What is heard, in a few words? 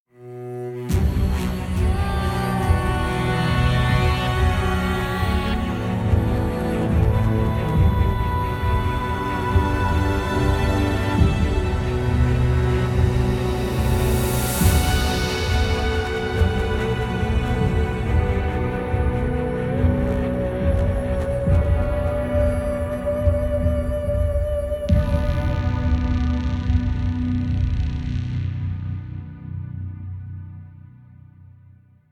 lose; bluestar